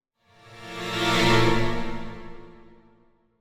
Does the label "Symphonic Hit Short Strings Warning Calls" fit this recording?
Yes